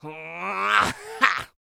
yell, male human, R&B dance vocal

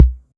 Kick, 606; classic machines

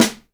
SD DRY, Korg EMX-1, Drum Machines

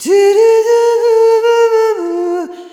Arrhythmic F

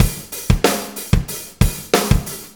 Drum Loops; Drum Tools; Sonic Foundry (Sony Creative Software); Various Loops

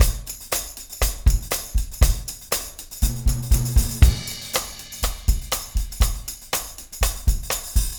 120 4 4 STrimbt tam; Big Fish Audio

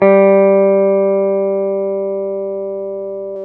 GUI SUS G 2, E-Guitar; E-GUITARS

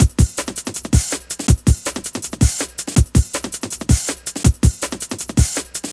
Loops, breaks; M8

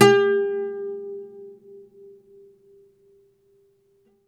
OUD G3, Plucked Strings; E-MU Producer Series Vol. 7 – Old World Instruments

